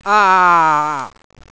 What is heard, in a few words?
aargh